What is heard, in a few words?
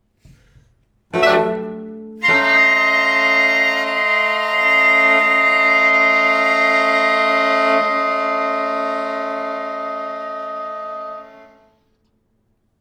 Soaring